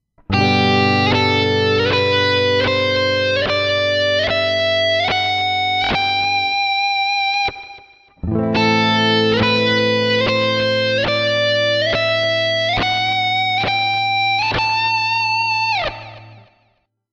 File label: shred